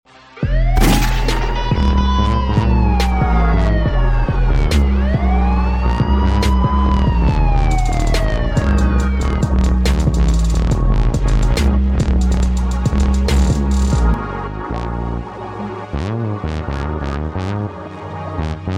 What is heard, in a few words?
TRAP